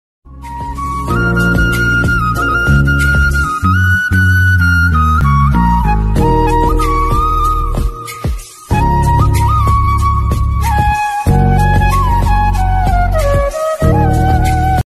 new bansuri ringtone new flute ringtone instrumental ringtone best flute short